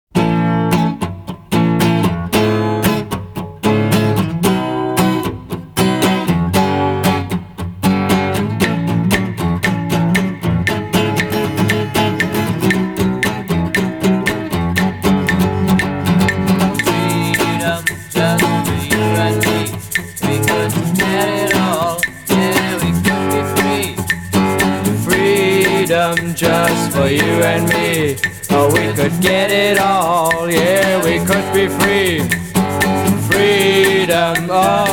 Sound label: acoustic version